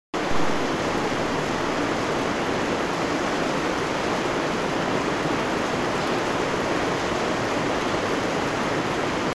heavy rain

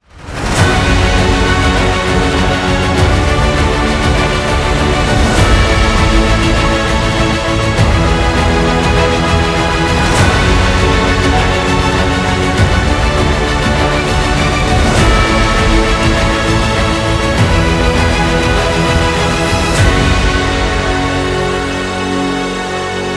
epic hybrid orchestra

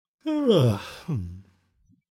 male yawn

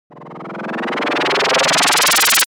spinning fire fx

